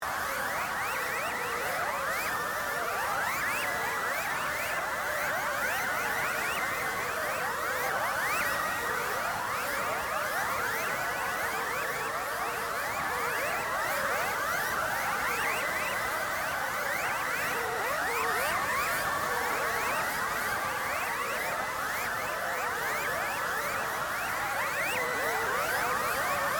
AMBIENCE AIRWAVE INTERFERENCE; Various Ambience created through sound manipulation in FMOD; Artificial Sound Effects

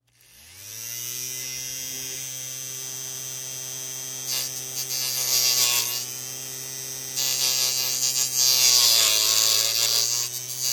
Fan Small Handheld Interference Dies; Big Room Sound

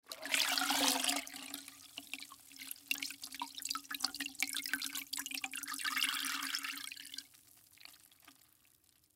Mop Water Drips Into Bucket; Household; Cleaning

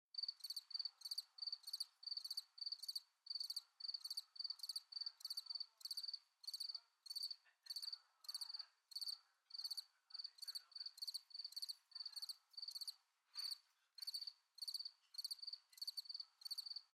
Crickets Night Chirp Single; Crickets (Sound Effects:Animals:Insects); Big Room Sound

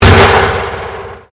Convolution Pulse

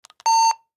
Walkie Talkie Push Button Beep; Button Press Hold

